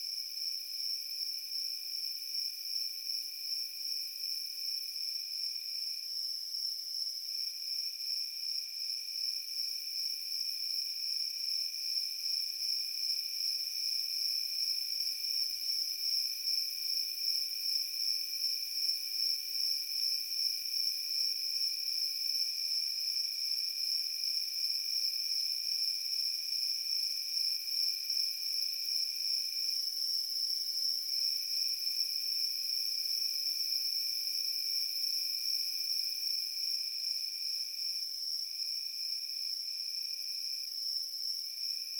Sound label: summer night crickets loop